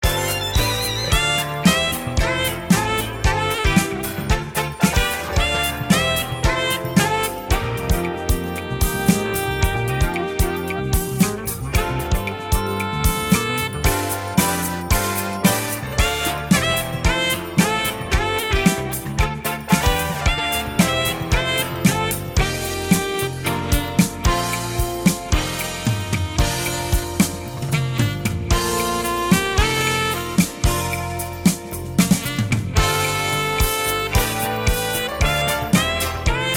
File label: Slotmachine; zombie party